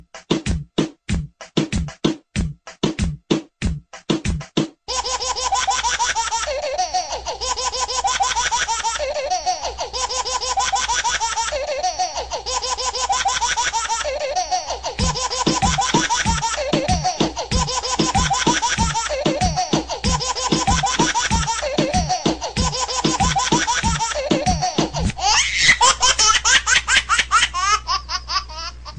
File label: Funy Baby Laugh Mix